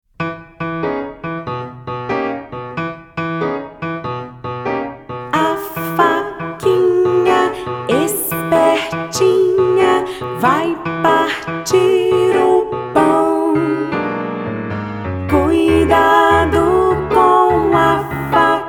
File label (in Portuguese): Vocal; Violino para os Pequeninos